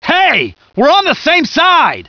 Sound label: hurt soldier